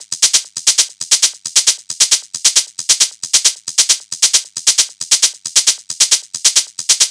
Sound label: Hat Loop 135, Techno